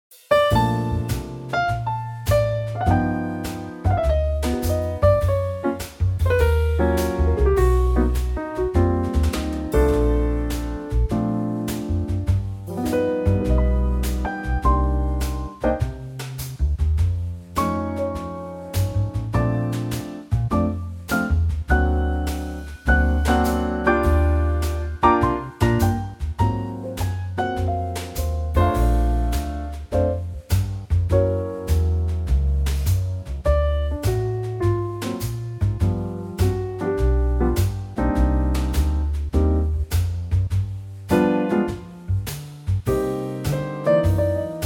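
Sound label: trio Bb, Backing Tracks for Male Vocals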